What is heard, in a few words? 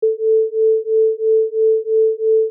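beat binaural, acoustic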